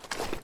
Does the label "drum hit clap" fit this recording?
No